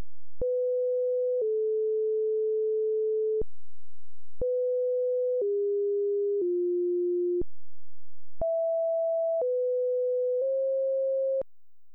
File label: indian poverty sine